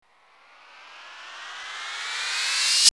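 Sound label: crash rise, techno; Drums In Your FACE!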